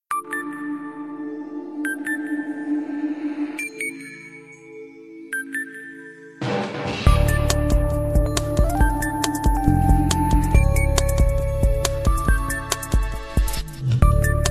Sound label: Sony Ericsson electronic; mobile; ring